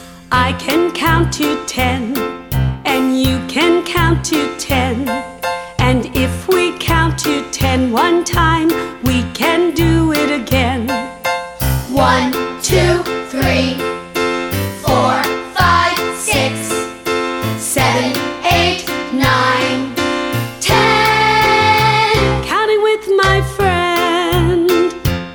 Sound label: I Can Count To 10 Tagalog German Hindi French